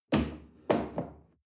chair wood place soft

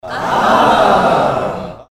little group ahh

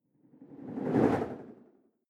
whoosh simple flutter phase low

